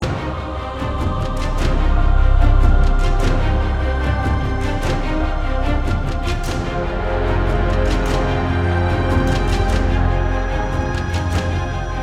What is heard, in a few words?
Castle Combat; Ferelden; Intensity